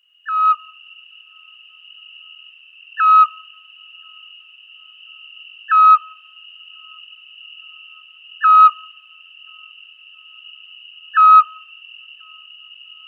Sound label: European Scops-Owl male display